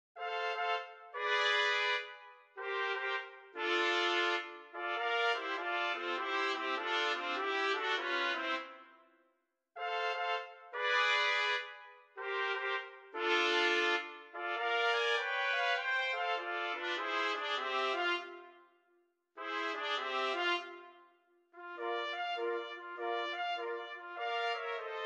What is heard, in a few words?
For my queen 3 trumpets